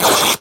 fabric rip; Fabric ripping sound effect 2

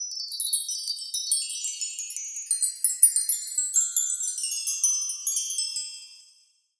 chimes slow fall; jingling high pitched scorcery sorcery wizard wizardry witch magic

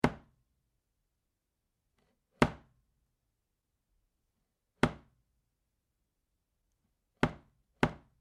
chopping board 100bpm; Meat Cleaver knife chop meat wood impact bang

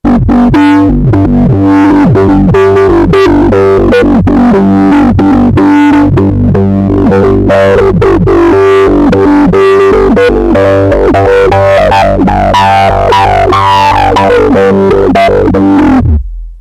grungy juno 120

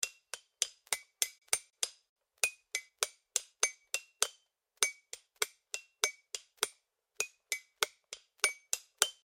cup hooks 100bpm; Mugs cups clinking tink

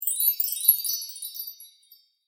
u chimes short

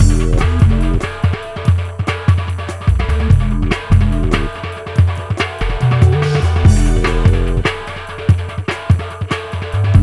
loop 144; fast dancy nasty bongo djembe darabuka handdrum drum percussive percussion

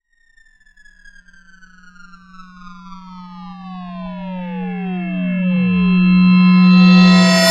nasty reverse

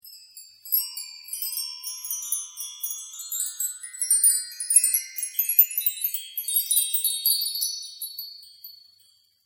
u chimes slow

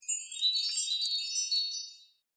chimes short, windchimes

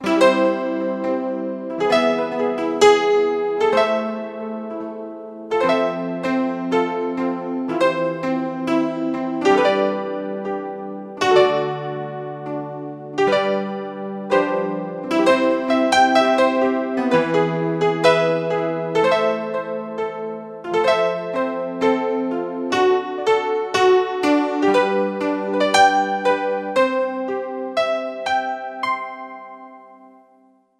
slapstick romance; Romantic space filler funny honky tonk piano love scene pastoral gentle mellow

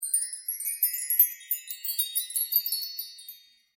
chimes short, windchimes; tinkle jingle fairy magic spell

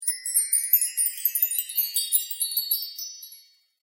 u chimes

